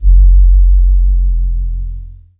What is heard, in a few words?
Pressin Sub - 4A, Bass; Jungle; Blu Mar Ten; Jungle - 1989 to 1999